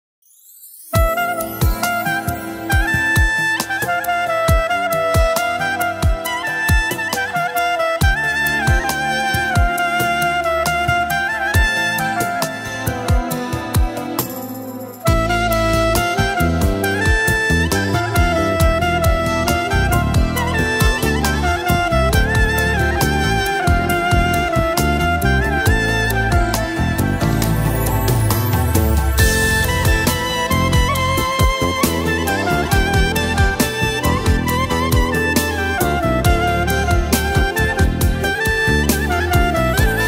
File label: clarinet кларнет